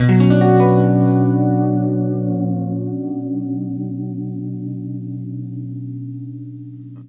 FLESH CHORDS, Guitar

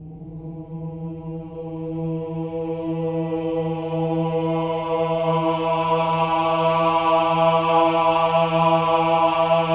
OH-AH E3, choir; Spectrasonics; Symphony of Voices

